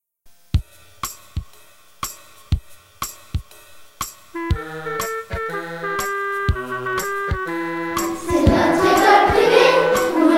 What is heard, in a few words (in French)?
Chant